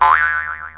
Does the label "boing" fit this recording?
Yes